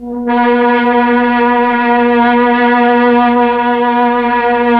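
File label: Mellotron Strings B3, Slow Strings; Keyboards of The 60's and 70's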